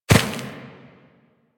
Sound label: Ram Step Run Grass; Animals; DE; Warframe; Quests; Duviri